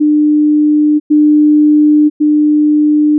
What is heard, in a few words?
Three successive 300Hz tones are presented in stereo; must use headphones; Fundamentals of Sound